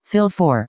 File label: Fill